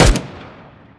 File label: m16a1; zmall